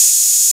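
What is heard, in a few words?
808 open hat; Ensoniq ZR-76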